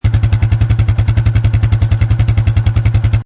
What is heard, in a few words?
Quad Bike Idle Loop Exhaust Perspective; Quad Bike engine loop. Motorbike, motorcycle type Quadbike. Seamless; Motorcycle Sound Effects